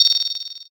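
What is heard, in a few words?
bell; suzu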